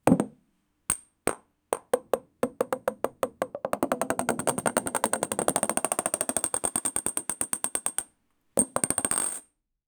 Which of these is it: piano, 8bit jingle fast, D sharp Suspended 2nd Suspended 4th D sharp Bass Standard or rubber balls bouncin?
rubber balls bouncin